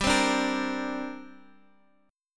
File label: D 7th Suspended 4th Sharp 5th, G